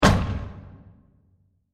Enormous Hit; Blasts; Impacts; CraftedSignals

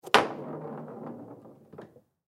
Foosball Table; Foosball Table Ball Insert Start Slot Roll

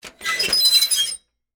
Iron Board Unfold, Household, Metal, Squeak; Laundry

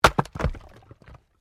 Wood Chopping Axe; Objects

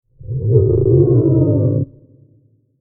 Creepy Monster Growl Transition With Reverb; Scary Soundscapes; Crafted Signals

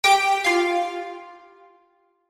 Chime Musical, Chime Musical Multimedia; Web Interactive; Chimes; Technology; Blastwave

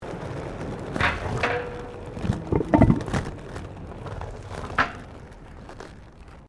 ROLLERBLADING MANHOLE AND VENT; Sports

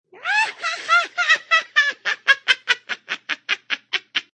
Witch Laugh; Evil Laughs; Sound Effects:Horror

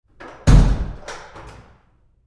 Door Bathroom Stall Close Roomy Med w Lock

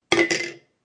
Bowl Spoon Drop, Spoon drops into ceramic bowl.; Spoon Drops into Bowl 1; Spoon bangs in ceramic bowl; Objects

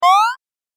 Game App Jump, Video Game Sound Effects

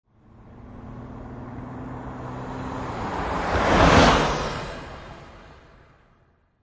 Mustang 68 Fastback Drive By; Cars; Lynne Music

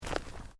Footsteps Boots Road Gravel Step; Footstep Foley; Human

